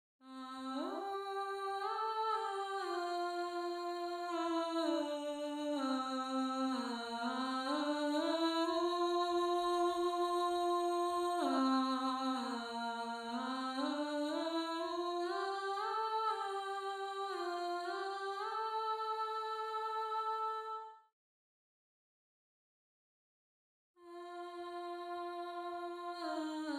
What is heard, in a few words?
Alto, counterpoint